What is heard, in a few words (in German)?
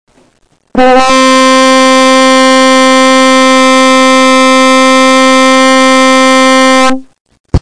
Posaune, Ton c